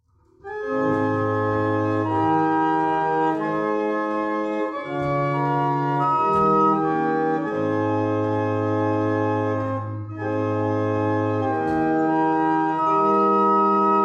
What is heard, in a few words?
barrel organs